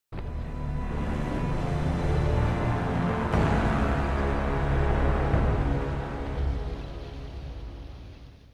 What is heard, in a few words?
Death (music); sonidos de muerte; Fallout New Vegas